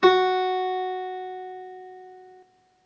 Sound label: string 1 fret 1